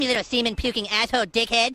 puking; sea men; cartman

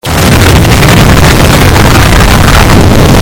explotion

